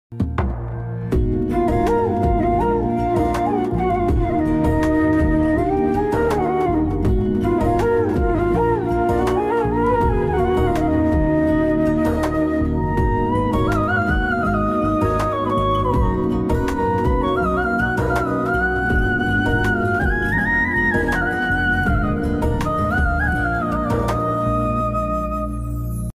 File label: instrumental ringtone, Pakistani